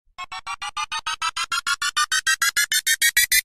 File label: build up